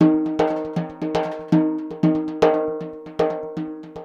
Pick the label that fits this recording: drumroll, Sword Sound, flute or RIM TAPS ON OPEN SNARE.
RIM TAPS ON OPEN SNARE